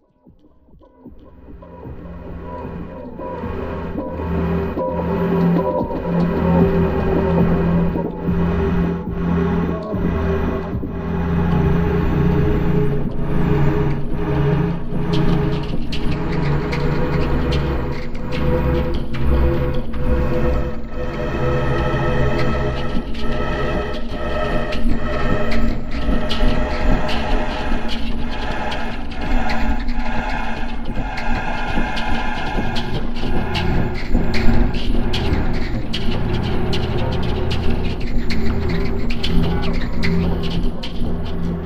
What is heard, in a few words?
ion storm example